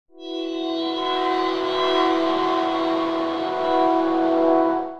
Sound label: land spring loop; smite; chaac